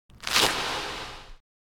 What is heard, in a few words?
Jump From The Sand Ground